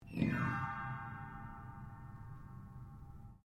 PIANO UPRIGHT BROKEN STRUM DOWN, Upright piano without keyboard; Pianos; Musical